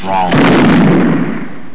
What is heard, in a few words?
WRONG; terminator